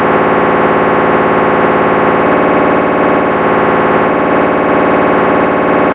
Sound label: ardis, scanner